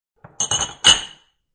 Stack Glass, A glass clanks onto another when stacked.; Stacking a Glass; Glasses